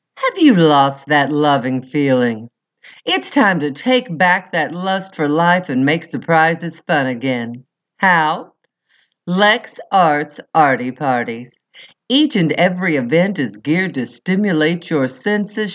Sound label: Upbeat